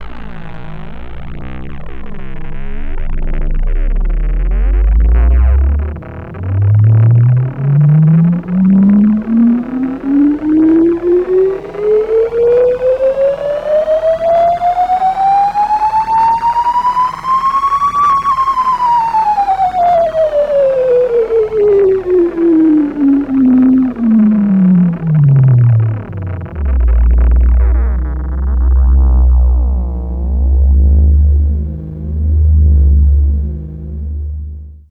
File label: el blurps, special FX twisted; USB Soundscan; AKAI